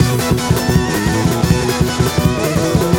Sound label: Fast Dance; iCarly: iDream in Toons